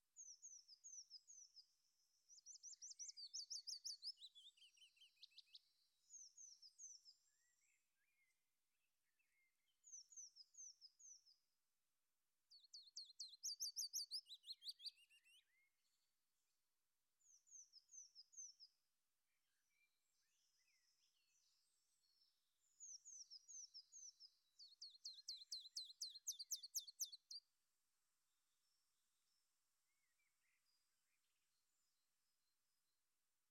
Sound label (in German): Mischs Fitis Zilpzalp; Mischsänger Fitis / Zilpzalp; Roxel, 24.4.2009; Jan Ole Kriegs